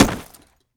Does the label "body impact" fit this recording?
Yes